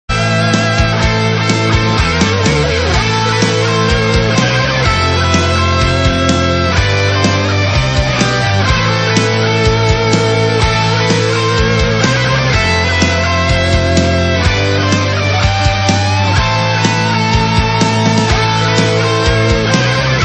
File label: RockMetal Cover; Golden Axe